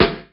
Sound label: studio steel snare drum F sharp key